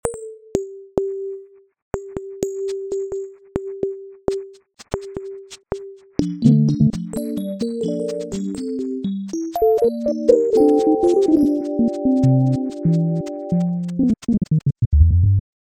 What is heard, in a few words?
jingle; lil keys scratchin in the grass